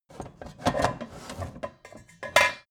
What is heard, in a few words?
pan handle; berufe